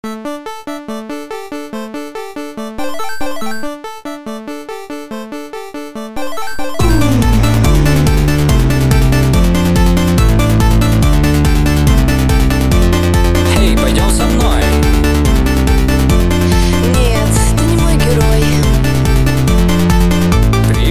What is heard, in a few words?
8bit